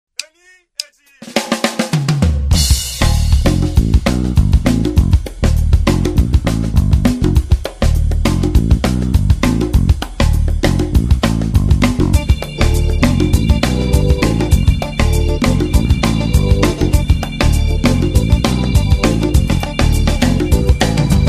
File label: Afro Beat Jazz